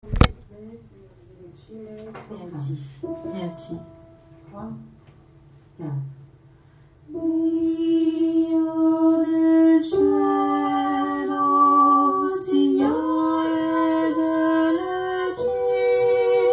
voix alto et sop en fond

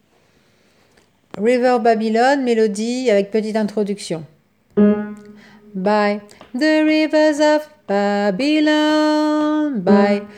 melodie alto baryt sop

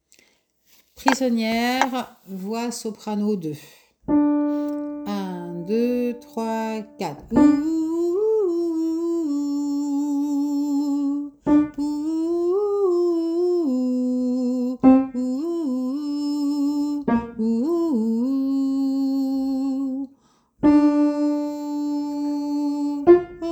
voix sop 2

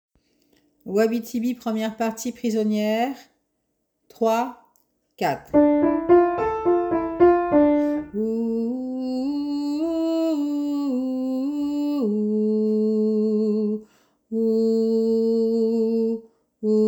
voix Btb

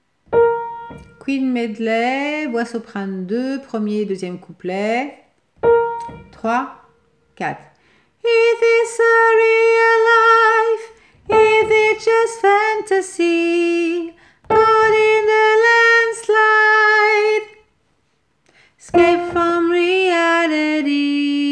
voix sopran 2

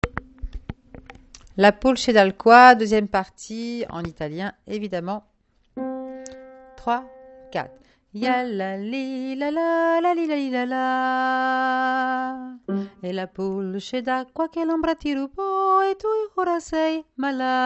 voix melodie; la pulce daqua; LA DEMOISELLE / PULCE D'AQUA

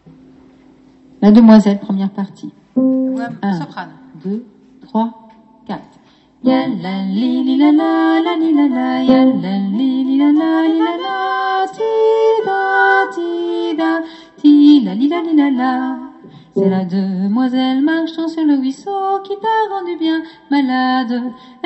MELODIE ET SOP

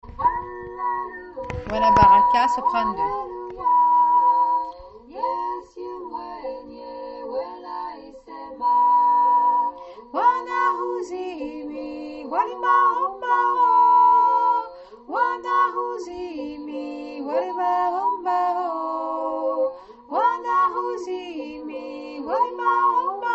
contre chant sop2; wana baraka

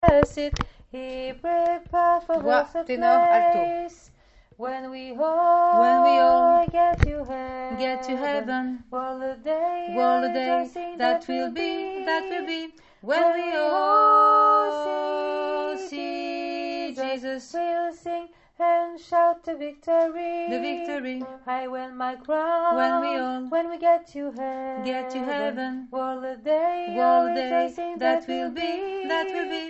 alto tenor barytone

